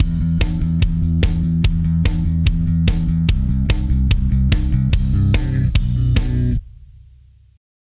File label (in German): ergebnis sidechain; reaper